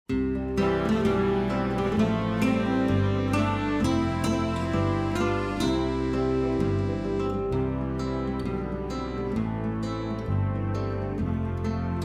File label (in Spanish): sin voz; la cuncuna amarilla